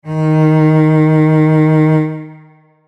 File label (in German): Cello